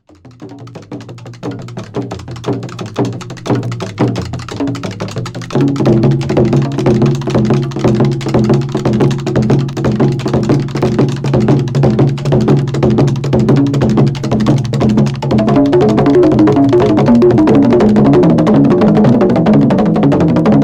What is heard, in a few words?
music of africa drum